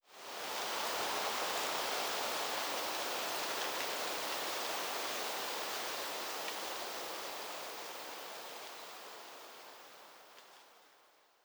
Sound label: Tree Rustling; Survival Game Sounds - Lite Edition; Environment